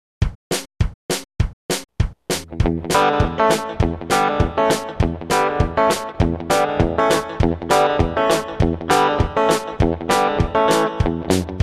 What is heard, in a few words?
Rhythm Guitar